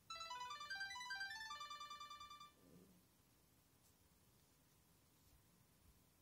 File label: qtek ringtone